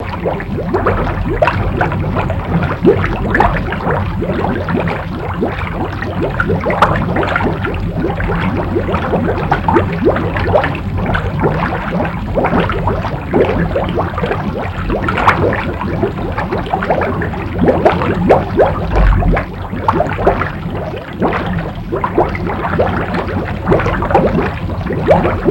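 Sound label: Bubbling Heavy Looping; bubbles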